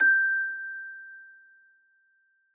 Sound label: Celesta Higher G